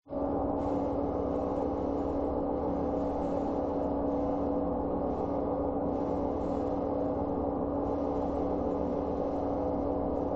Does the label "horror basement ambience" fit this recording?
Yes